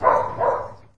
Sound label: dog, animal